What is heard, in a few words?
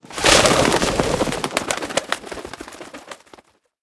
bird startle away; animal; modern warfare 2